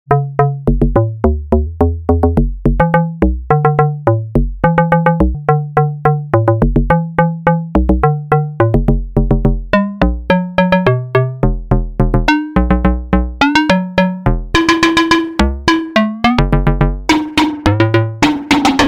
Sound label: E330 FM mode Out2 to FM Mod